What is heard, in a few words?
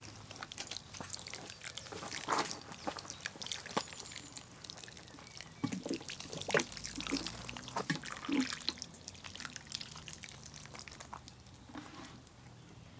Watering Plants Polytunnel Gardening Watering Can